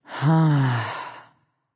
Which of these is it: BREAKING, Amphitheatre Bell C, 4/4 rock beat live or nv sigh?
nv sigh